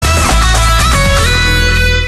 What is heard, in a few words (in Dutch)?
RADIO VERONICA RECLAME JINGLE